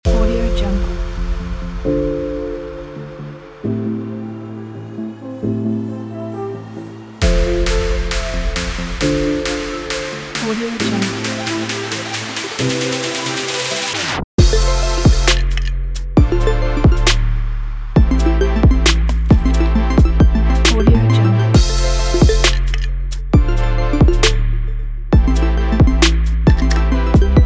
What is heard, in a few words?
future beat chill